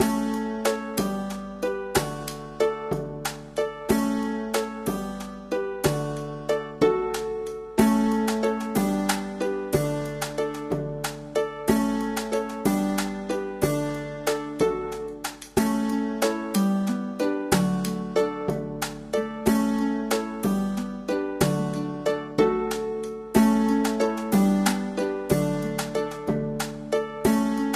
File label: Day Music; Fairy Godmother Tycoon